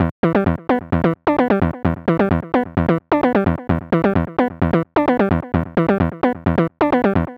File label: electro robotic synth arpeggio 130bpm